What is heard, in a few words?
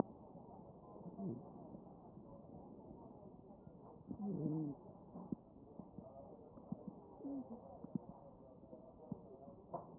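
anterior chest, position 1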